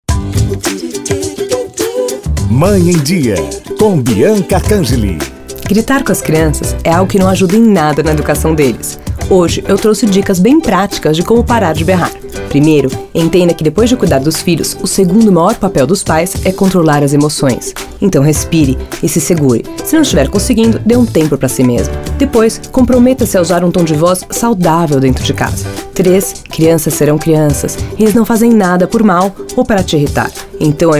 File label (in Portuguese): GRITOS